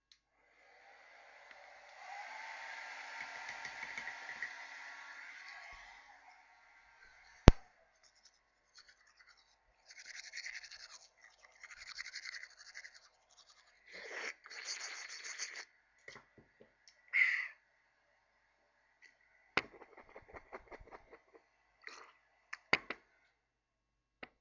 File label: Bathroom